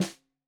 Snare 65 Reg Stereo, Deeply Sampled Drums

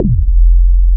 SH101; OLD ROLNDS

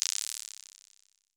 Synth Saw, Roland BK-5

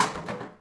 Foley Mid, Drum Bits, Sweeteners; layering

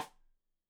Side Stick Stereo; Deeply Sampled Drums

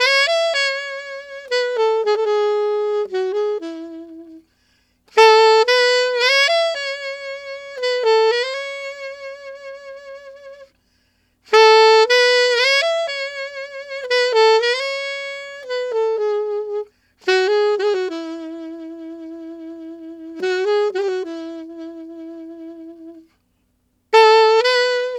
Alto Ballad in A maj, Sax; Sonic Foundry (Sony Creative Software)

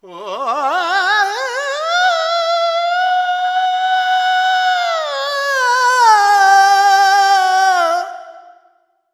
Expression, Vocals Male World Pop

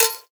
Perc Bell Pen

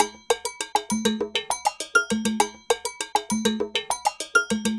Ensemble, Latin Percussion

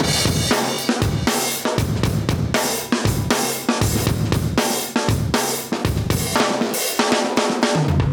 Live Breaks, 118

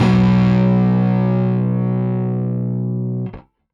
Rickenbacker Bass; Airwindows; Rick-Xtras

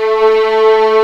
A3 LEG, String Collection; East West; The Ultimate String Collection

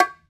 BONGO; World Percussion

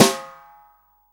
SD, REAL DRUMS, SWING KIT

